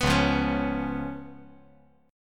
C sharp 7th C sharp

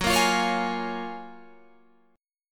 F sharp Major 9th F sharp, Guitar Open G

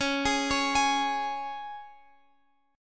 Db 5th Db down Guitar Open F; Db5 arpeggiated; JGuitar

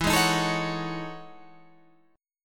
E Major 13th E down, Guitar; EM13 strummed; Standard; JGuitar

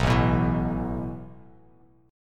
A Suspended 2nd A Bass Standard; strummed; JGuitar

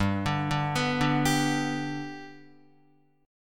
G Major G down Guitar 4F 4A 5C 5G 5C 6E; G arpeggiated; JGuitar

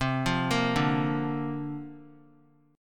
C 7th Suspended 2nd Suspended 4th C down

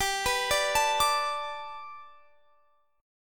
G Major Add 9th Guitar Standard, chord; x,22,21,19,22,22; Gadd9 arpeggiated

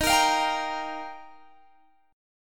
D sharp Major Add 9th, Guitar, Open G

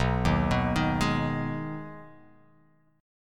B 6th B down, chord

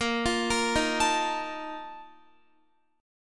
Bb Major 7th Suspended 4th, Guitar

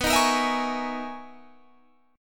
B Major 13th B down, guitar; strummed

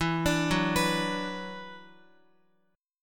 E 7th Suspended 2nd, Guitar; arpeggiated

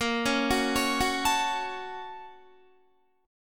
A sharp Minor Major 7th Sharp 5th, down, Guitar; 4E,4A,5D,5G,5C,6F; arpeggiated

